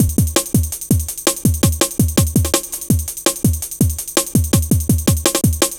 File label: Step Off, drum loop, jungle, 165.5; Zero-G Jungle Warfare 1, 2 & 3